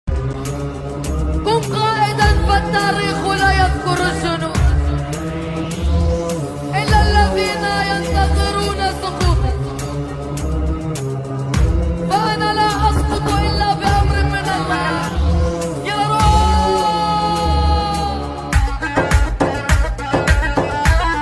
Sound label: lati arab